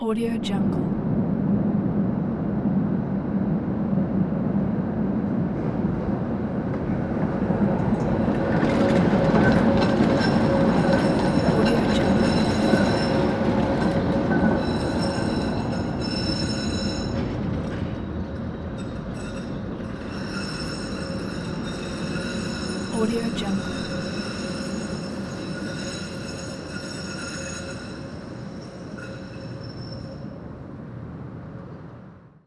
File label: tram leaving a tunnel